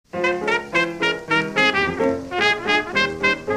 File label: jazzy solo